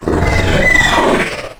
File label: dragon scream